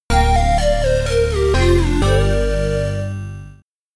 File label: Game Over, amiga